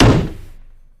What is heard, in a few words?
desk slam juror